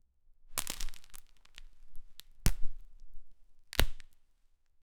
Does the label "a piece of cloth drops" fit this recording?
Yes